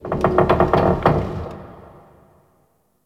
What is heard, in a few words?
Ice Metal Creak; Warframe; Corpus Ice Planet